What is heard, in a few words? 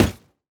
plastic hit